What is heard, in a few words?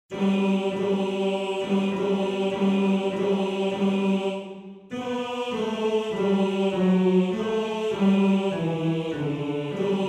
Bass; Quel Mazzolin; Bass 1